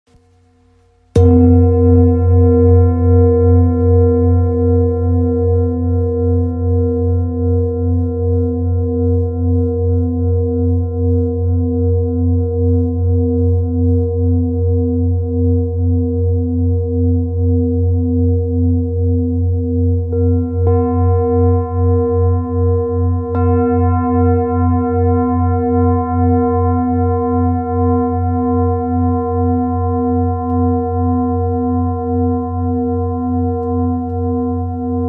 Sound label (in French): Bol ramgandi fa